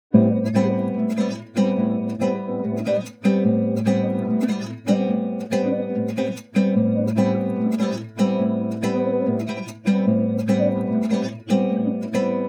guitar; SiSEC11

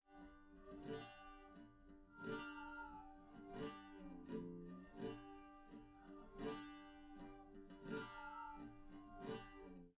no drums, live rec; SiSEC11; underdetermined

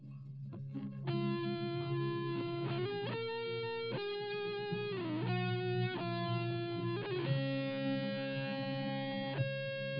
nodrums inst src; SiSEC08; SiSEC underdetermined

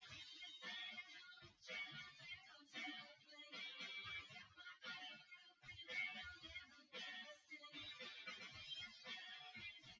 wdrums synthconv 250ms 5cm src; SiSEC08; SiSEC underdetermined

